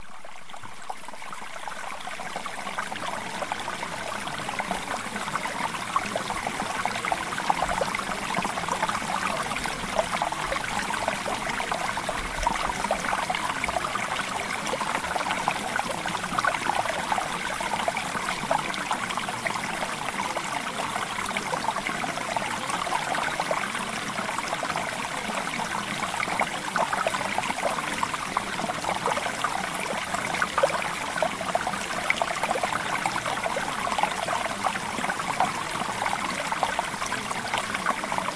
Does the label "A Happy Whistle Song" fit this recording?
No